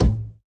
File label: Boss Bomb Spawn; Winkydink